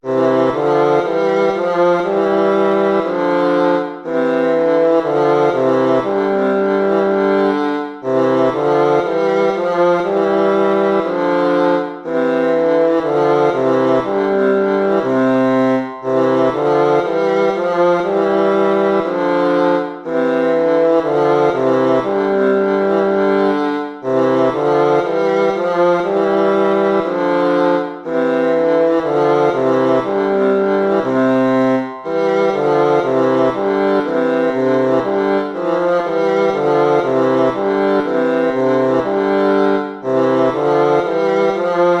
gavotta; hook james

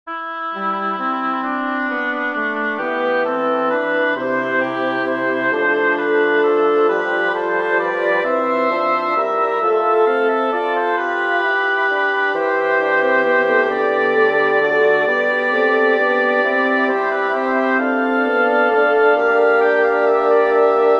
for double reed quartet; bach johann sebastian; fughetta christe aller welt trost

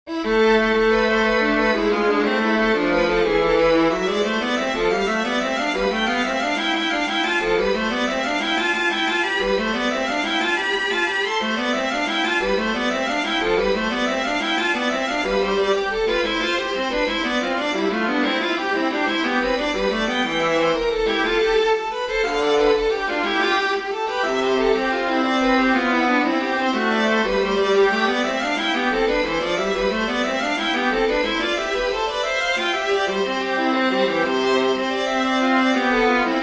gigue a minor for violin viola; Johann Sebastian Bach; from the english suite

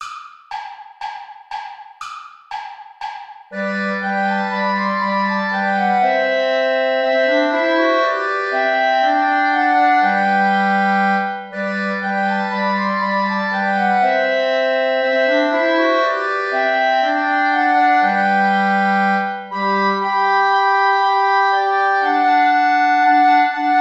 play along without clarinet; steuerlein johann; mit lieb bin ich umfangen

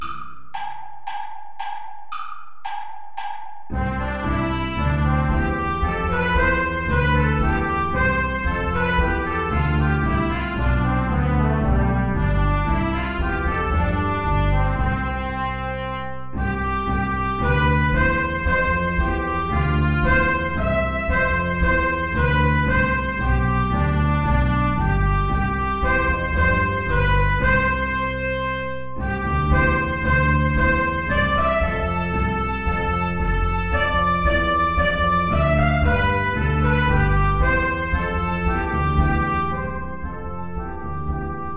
air play along without guitar

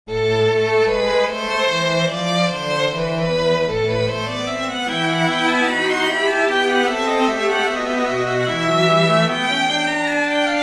for string trio; bach johann sebastian; sinfonia a minor

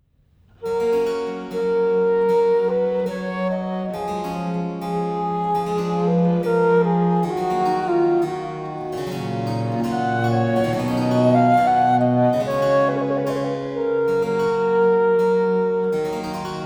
flute sonata

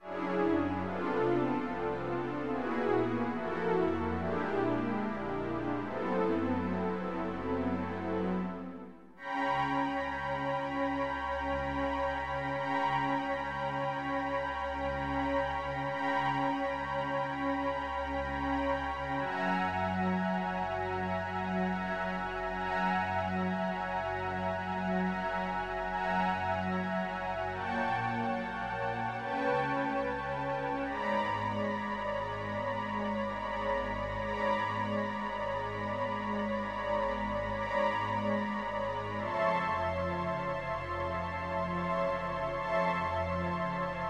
divertimento d major; cotton aaron

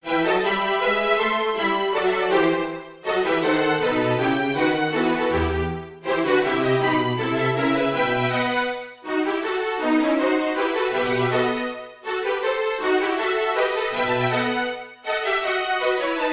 for bassoon strings; bach johann sebastian aria leichtgesinnte flattergeister

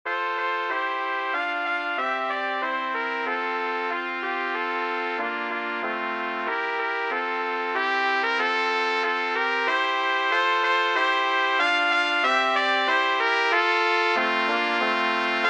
bourree major; krieger johann

